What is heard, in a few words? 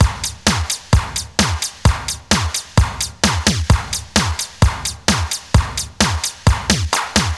Beat Clap City; Zero-G; Future Wave; Beats